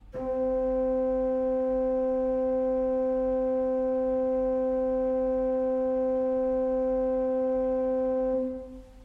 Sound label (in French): montre 8, Orgue; La traction est entièrement mécanique.; Grand-Orgue